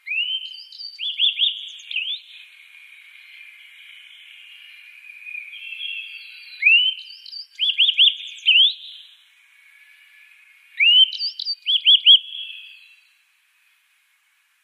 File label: Yellow-browed Bunting song